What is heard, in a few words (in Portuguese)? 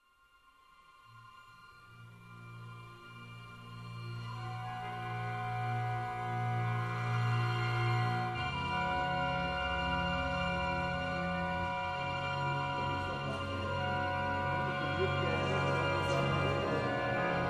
para orquestra; "Sicut erat in principio" para orquestra (1998); Harry Crowl